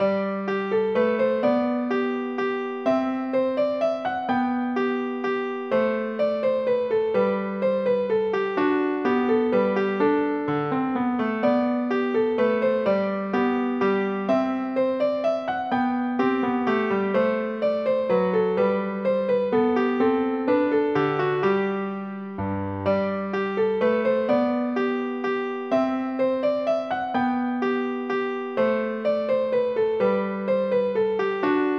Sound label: MINUET G